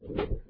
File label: sound open; replicaisland; android 6.0.1